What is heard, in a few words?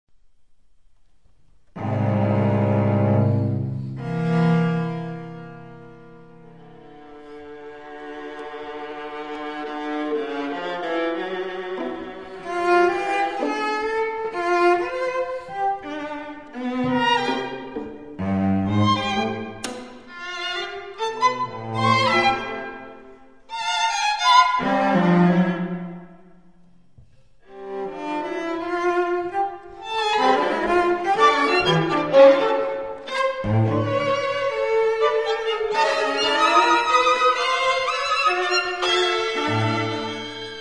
string quartet; Stråkkvartett nr 3; sats 2; Op 41, 1974, 15'; Stockholmskvartetten, Radiohuset Studio 2, Stockholm